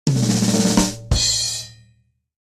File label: Drums Punchliner